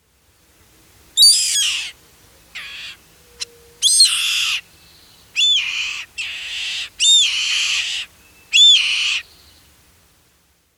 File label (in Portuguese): carrapateiro